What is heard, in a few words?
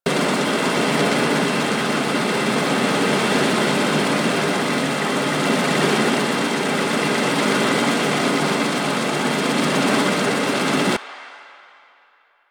Cave Bedroom Air Conditioning Loop; Ambience; Warframe; Stalker Cave